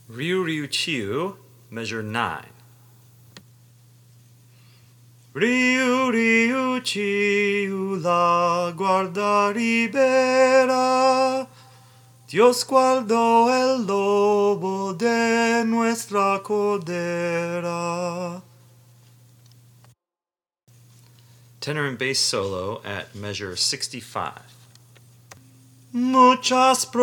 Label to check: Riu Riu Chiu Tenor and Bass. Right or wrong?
Right